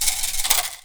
PU IL, HAWAII PERC

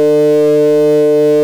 BUCHL, Rare Synthesizer; Hollywood Edge; Giorgio Moroder Rare Synthesizer Collection

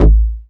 bass attack, techno trance; Ueberschall; Techno Trance Essentials

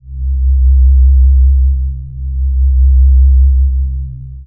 Single Electric Basses, Dance Floor Bass

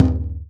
BASS, INDIAN PERC

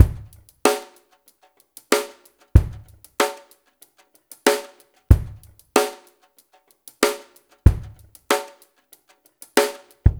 HI FID, DRUMS; Spectrasonics; Liquid Grooves 1 & 2

